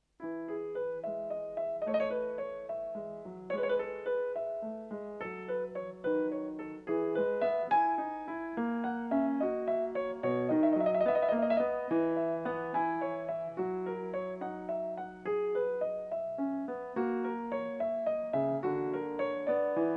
Prelude In E Major; Bach; BWV 854

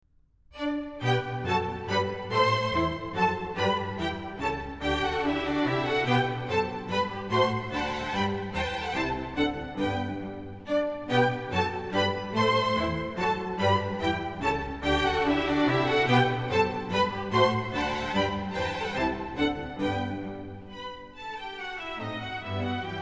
Serenade; Chamber Music; Serenade No. 13 in G, K 525 'Eine kleine Nachtmusik'; III. Menuetto (Allegretto) & Trio

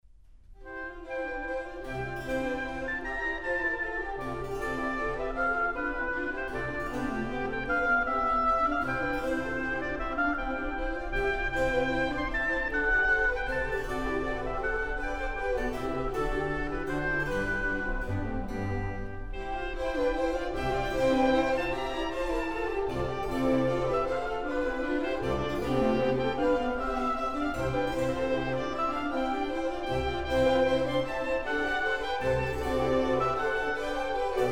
Orchestral Suite In C Major, Forlane; Bach; No. 1; BWV 1066

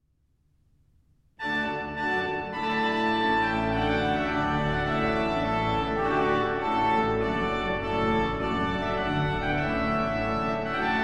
Trumpet Tune, Organ; Ian Tracey; Organ Classics

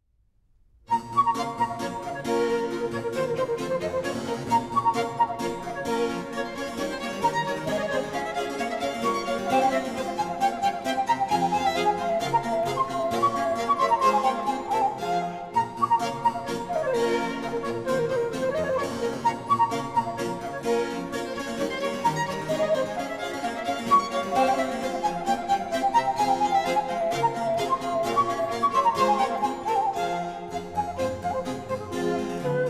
Orchestral Suite In B Minor, Badinerie; Bach; BWV 1067

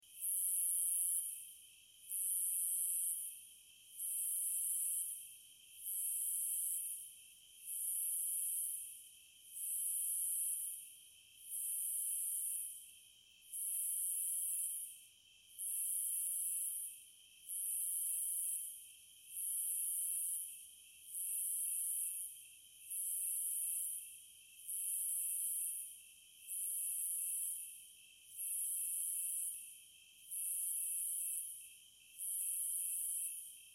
cicadas; American Wilds

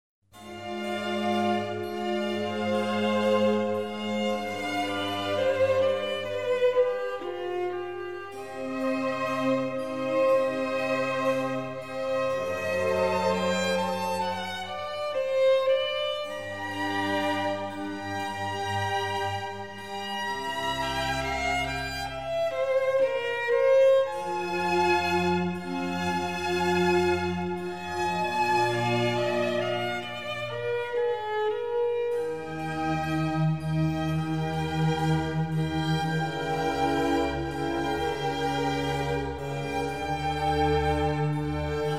Adagio from Concerto for Violin in B flat major; Albinoni

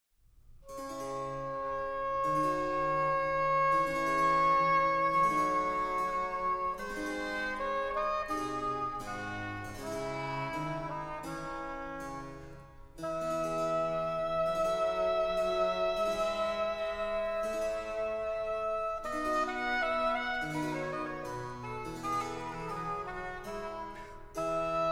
Concerto; Vivaldi; Concerto No. 9 in D minor RV 454 - Largo

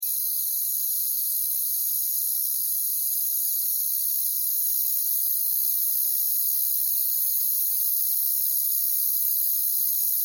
Crickets; Jungle Talk

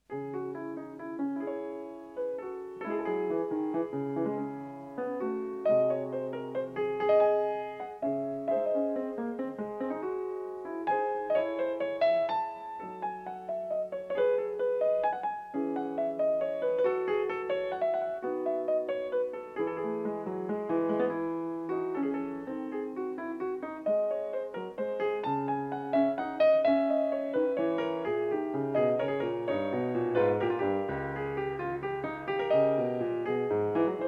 Prelude In C Sharp Minor, Clavier; Bach; The Well-Tempered Clavier; BWV 849 (Book 1)

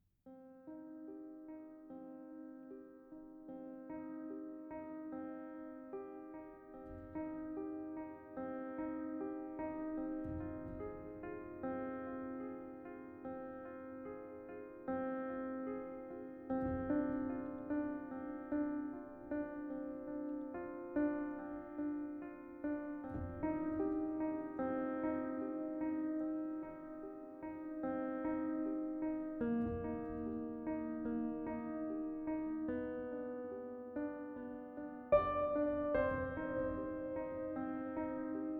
Departure (Lullaby); The Leftovers; HBO Series - Season One

